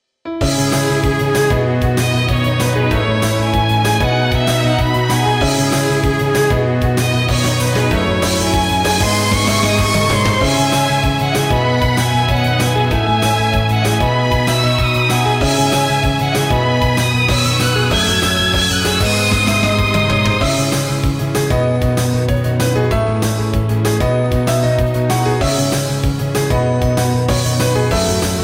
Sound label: Battle Forest